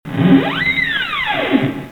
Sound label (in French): Grincement de porte